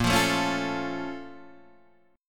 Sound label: Bb Minor Major 7th, Guitar, Open D